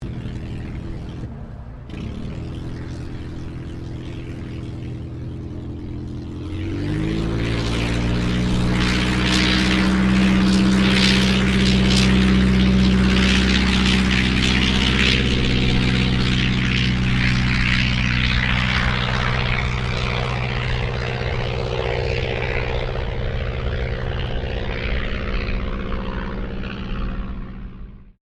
AIRCRAFT DEHAVILLAND TIGERMOTH TAKEOFF, RAAF Tigermoth biplane training aircraft; Airplanes